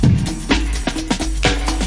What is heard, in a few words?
oldskool break, drum loops and breaks